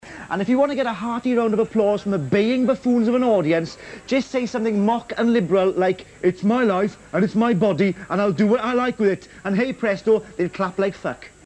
clap

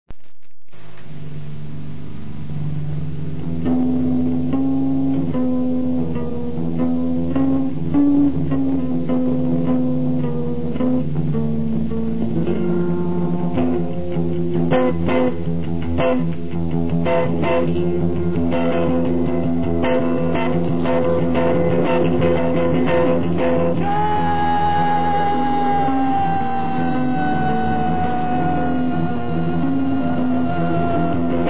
cry

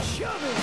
shove

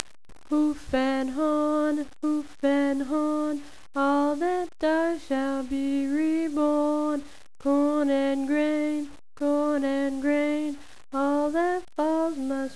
hoof

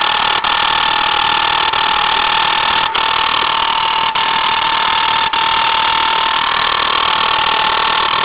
Bladder Cicada, Cystosoma saundersii

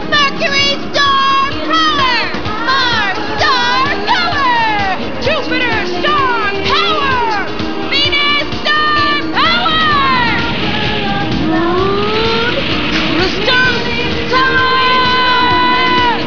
POWERUP; sailor Scouts

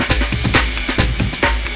jungle 135

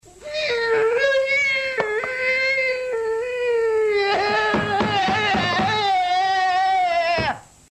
cry

